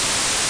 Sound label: whitenoise; Anchorman Press Kit